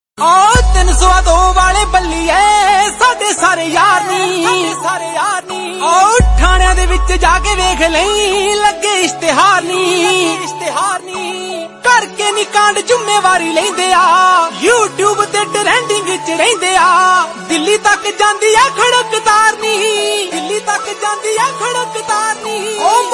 Punjabi Song Ringtone

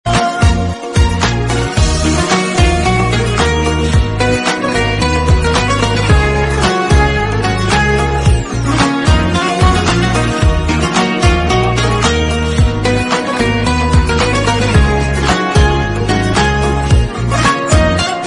Guitar Ringtone

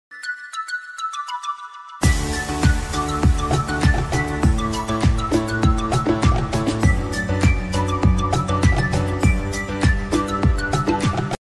Android Mobile Ringtone

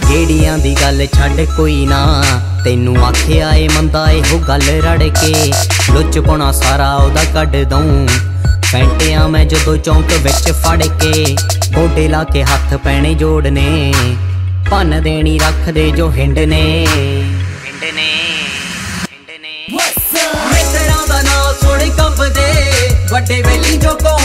Punjabi Song Ringtone

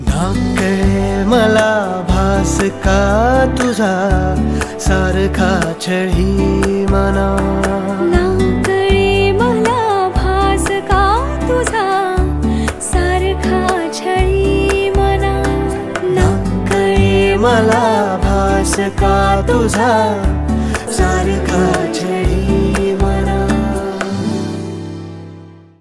Marathi Song Ringtone

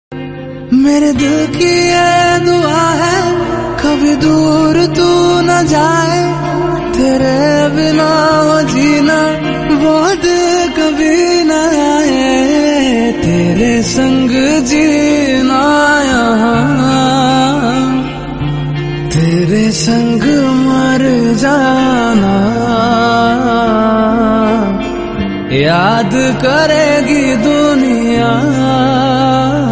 Hindi Song Ringtone

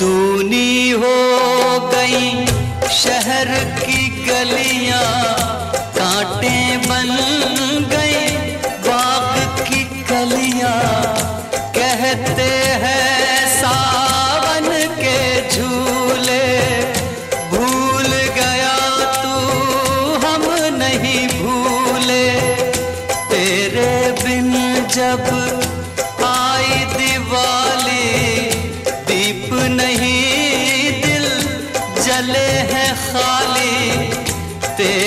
Sad Ringtone

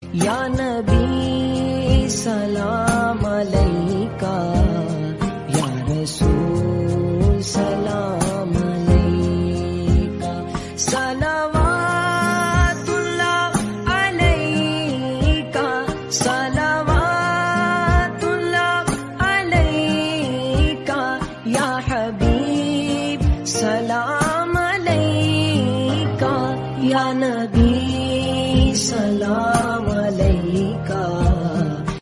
Islamic Ringtone

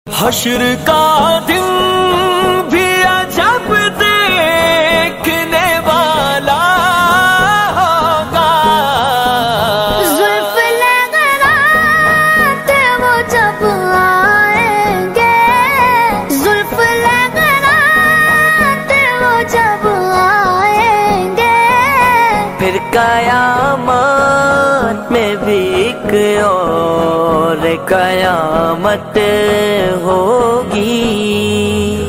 Naat Ringtone

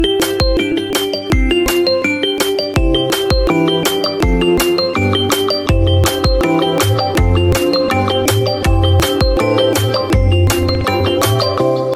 Xiaomi Note 8 Original Ringtone; ringtones

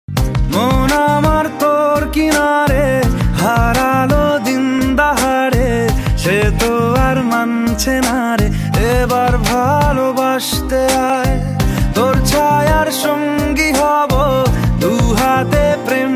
Bengali Song Ringtone; ringtones

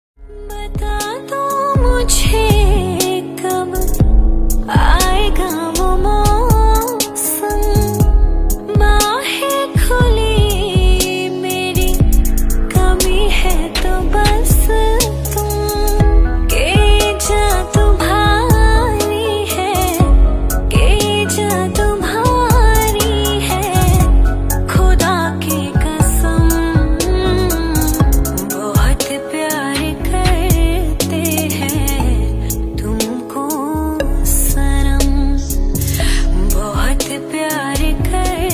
Female Version

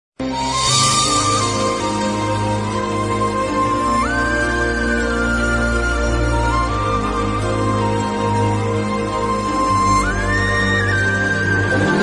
Malayalam Movie Bgm Ringtone; ringtones; Devadoothan

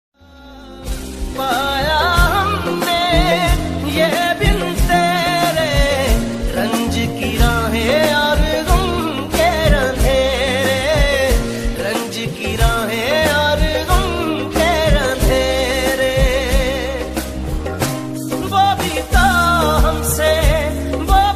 Sad Song Ringtone

